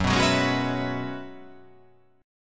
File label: F sharp 9th Suspended 4th F sharp, chord